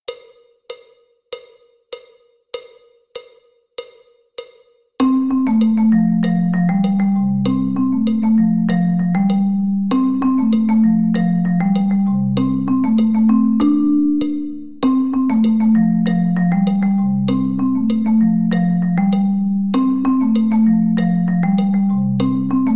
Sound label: backing track; rhythm clock